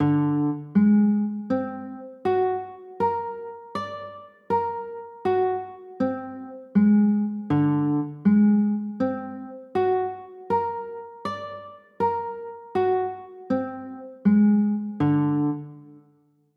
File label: g sharp 9b5; chords